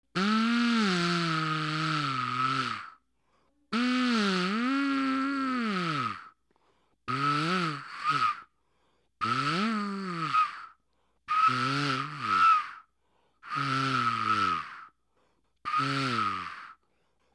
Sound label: solo